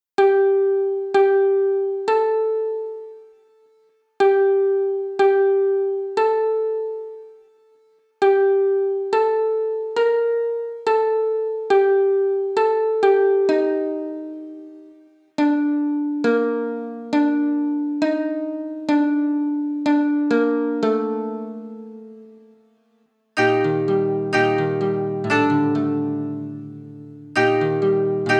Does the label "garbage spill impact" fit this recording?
No